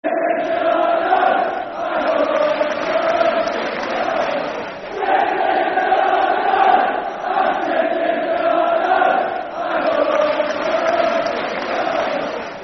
City Till I Die, Football Chant (Soccer Song); Bradford City Bcfc

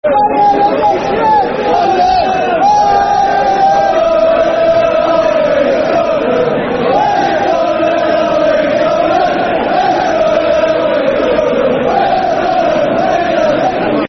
What Do You Think Of Sunderland Football Chant; Newcastle United Nufc; fanchants-com

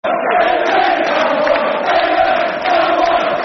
England's Number 1, Football Chants (Soccer Song); Tottenham Hotspur Thfc

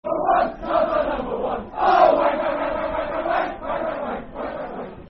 Number 1 football chant (soccer song); Brentford

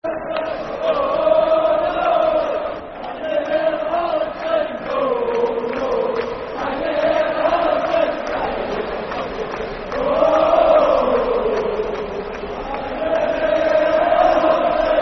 Football Chant, Soccer Song, Scottish; Can You Hear The Holland Sing; Scotland

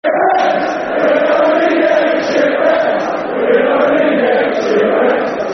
Football Chants (Soccer Songs); Shit Ref's; Ipswich Town Itfc

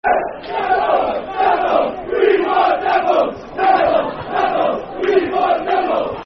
Football Chant; Nipples; Dundee United Dufc

